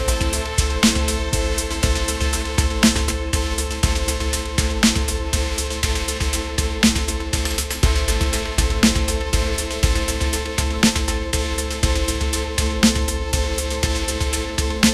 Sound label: upbeat pop melody, ringtones